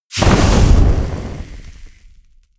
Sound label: burst